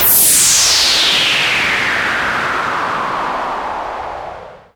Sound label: Downsweeps